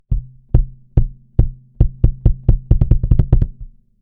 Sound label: kick